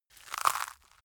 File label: crunchy hard